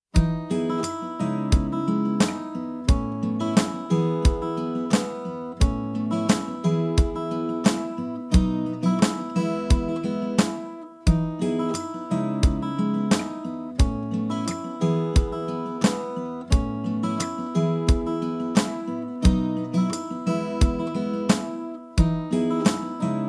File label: inst